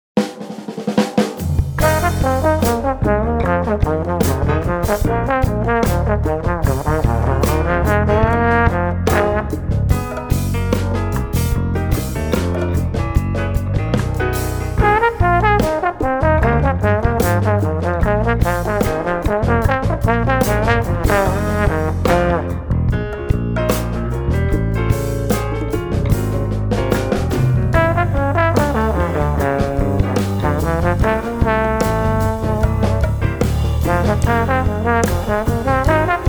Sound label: Blues